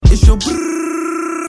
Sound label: brrrr texttone